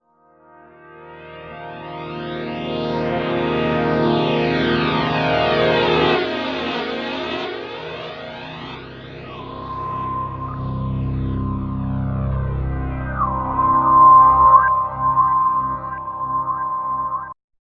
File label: Reverse Gt Pad, Roland V-Synth; Factory Patches